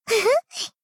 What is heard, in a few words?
laugh